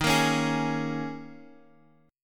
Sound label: D sharp 7th Suspended 4th D sharp, Guitar Half Step Down